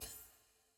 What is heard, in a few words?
ui hover; main watch